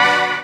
Synths H; Vengeance Samples

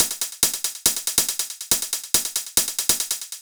Closed Hihat, 128 BPM; Rap; Vengeance Samples; Vocal-Hook Kits; Song Kit

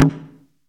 Minimal Perc; Minimal Percussion

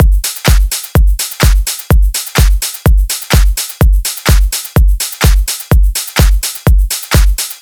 126BPM Drums; Vengeance Samples; Malta Kit

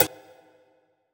hat; Dubstep Sample Pack

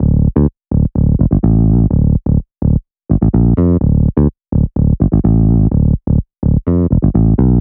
Root D 126 BPM; Vengeance Samples; VEH3 Special Sounds

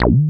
Synth Shots; Vengeance Samples

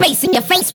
Vocal Phrases; Vengeance Samples; Vocals

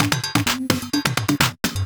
Houseloops 128BPM; Vengeance Samples

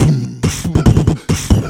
Beatbox Loops, Human Beatbox; Vengeance Samples; Special Sounds

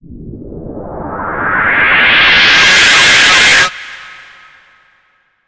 FX Uplifter

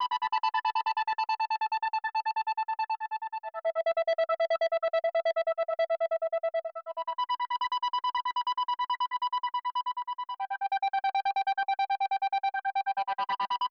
Whistle Synth DRY 140BPM, Eminor; Melody & Synth; Vengeance Samples

